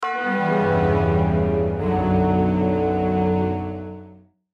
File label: se lose; Adventure Dig Dig 2; Android